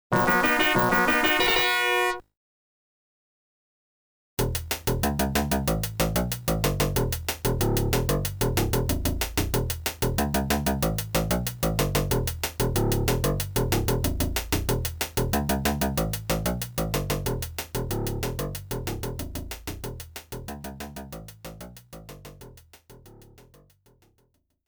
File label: Unused Jingle~Unused Music; Argus; Legend of Game Music Premium Box